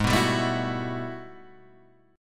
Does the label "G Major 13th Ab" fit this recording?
Yes